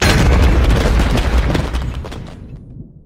totem sound; minecraft

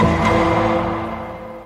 law order sound

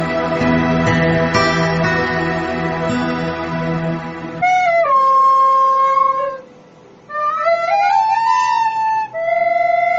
bad flute sadness sorrow; naruto

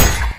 parry; ultrakill

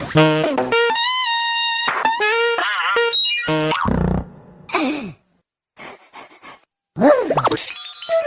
giraffe tries saxophone; baby beethoven

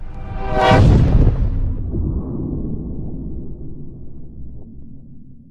a train zoom

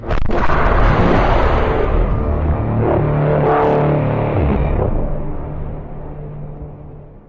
warp with song; astro bomber

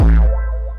wahwahw

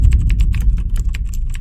typing sound

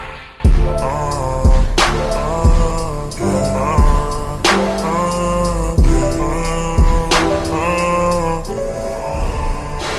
rizz song slowed reverb

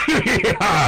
he he he haw

